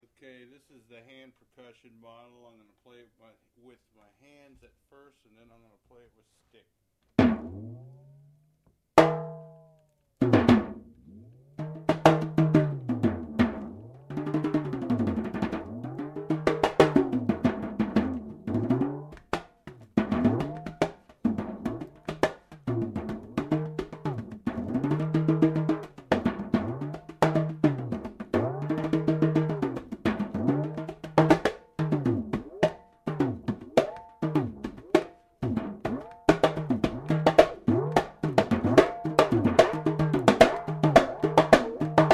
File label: 10 inch hand; Variable Pitch TALKING DRUMS